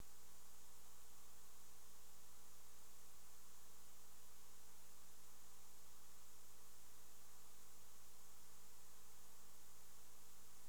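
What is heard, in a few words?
Guitar Lead Line Recording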